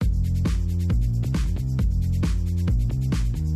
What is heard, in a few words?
beat left; Audio Processing; Python